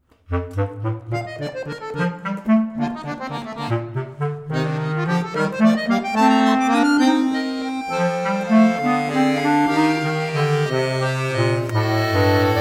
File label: Presto, ACCORDION and CLARINET; Galuppi; Barocco